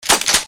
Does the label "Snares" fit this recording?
No